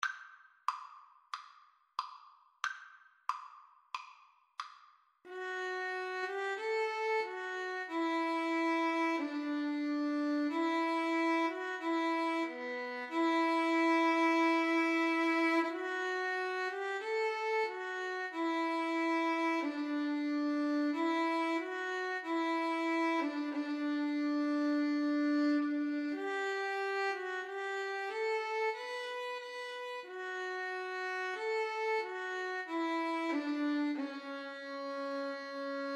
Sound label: Violin Viola Duet; bleak midwinter